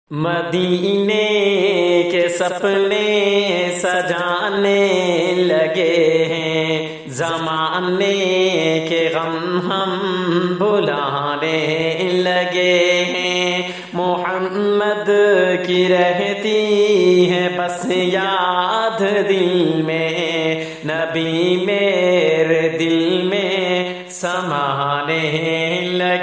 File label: islamic